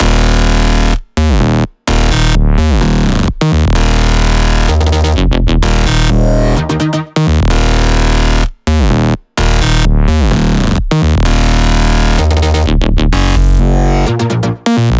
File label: 128BPM Melody Root D#; Vapour; Vengeance Samples; Vapour Kit